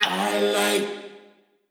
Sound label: Vocal one shot Ab; Slate Digital Omnivox Vocal Sample Pack